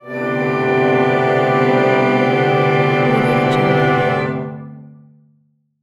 orchestra crescendo; blast drive 30; prosoundfx

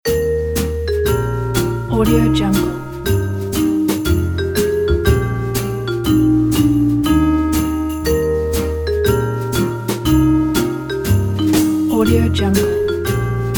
deck the halls in jazz

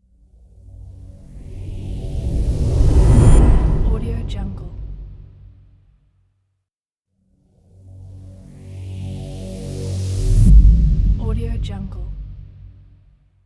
suspenseful transition

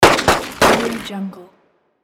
revolver pistol gun shots

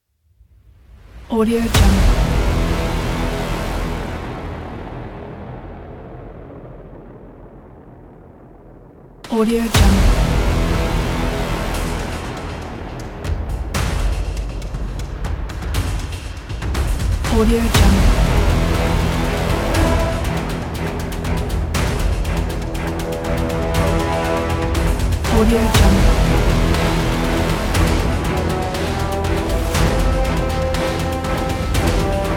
intro cinematic trailer

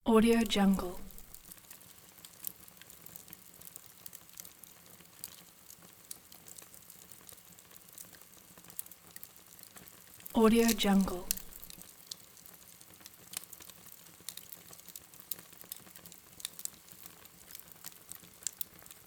fire

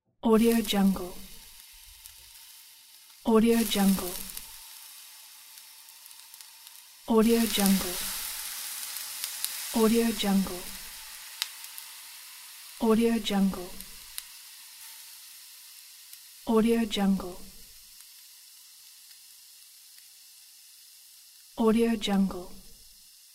steam of water on frying pan

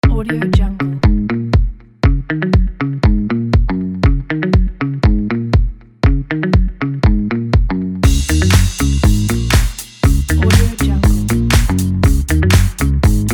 funk

